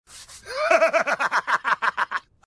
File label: horse laugh